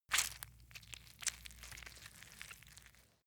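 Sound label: rag leather wring out, household; haus-garten; haushalt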